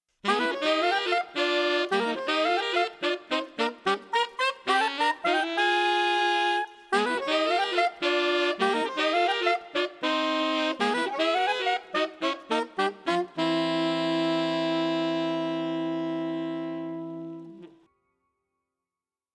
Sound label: Horn Outtakes